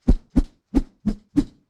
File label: Throw Woosh